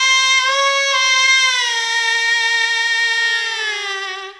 World Lick

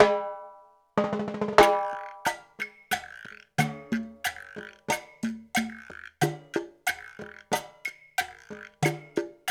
93 CHA, Cha Cha Cha, African Contemporary; Spectrasonics; Supreme Beats

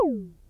SYNCUSSION

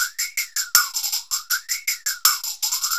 TAMB; Zero-G; Chemical Beats

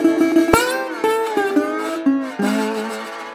120, Blues Guitar; ILIO - Fretworks

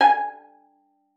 G#5, VIOLIN PIZZ; Xsample VOL-5

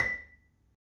marimba B4; Terkelsen

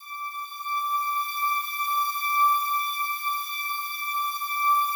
slow strings D5, Yamaha VP-1; 90 s Sample CDs; Music Store

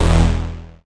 Synth Hit E; Trance; Synth Hits; Zero-G Trance Inducer; 90 s Sample CDs

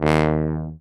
BARITON; Best Service; Alpine Volksmusik

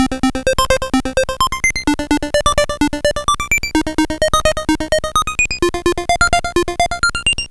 Game Boy Synth

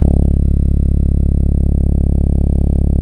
C#, Synclav FM, BRASS; Big Fish Audio

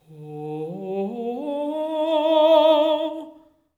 LYRICAL; Spectrasonics; Symphony of Voices

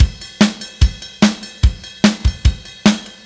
Beat, Indie Rock

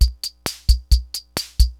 UNIVOX LOOPS; Rubber Chicken Software Sample Bank

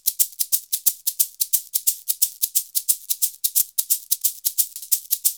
SHAKER, Modern Percussion Loops

